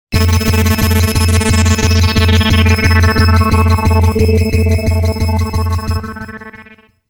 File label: Info Age; Soundzabound